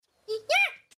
Panda Sneeze

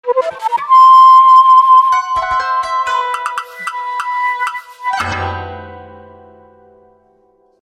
Ethno Japan

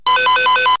Transceiver

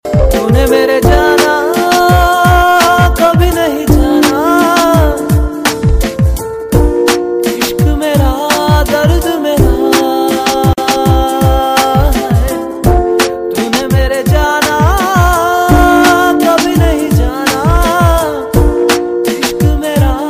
Hip Pop

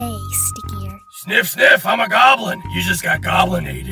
Sniff; im a goblin

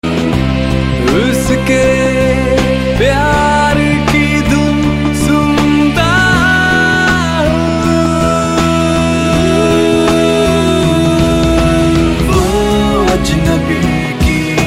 High Tone

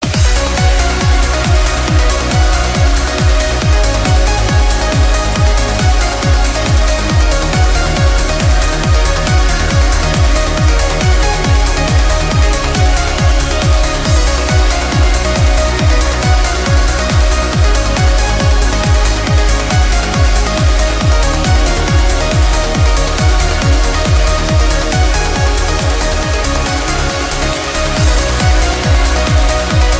Uplifting trance

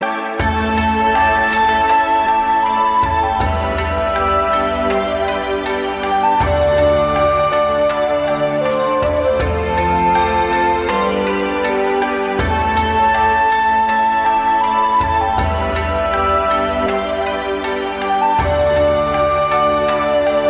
Sad Slow; Naruto

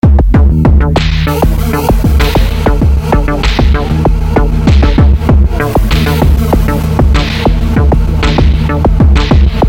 Sci-fi Beatz

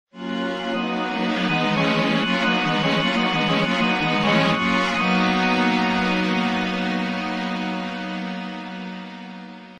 Chord

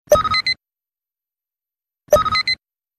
Beep Sound; The Unit

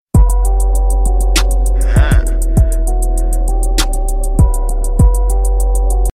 Good Beat

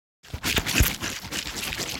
Squish Swish

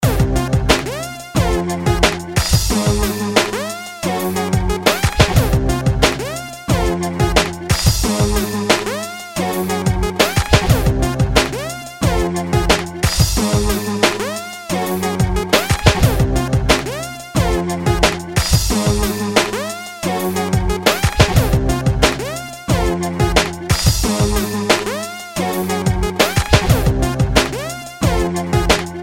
Hip Hop Beat

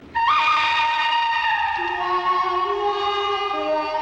Gun

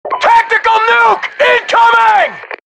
Tactical nuke

